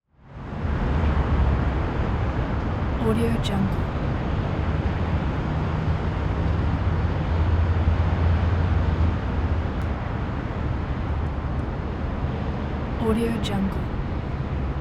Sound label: new york city off street light traffic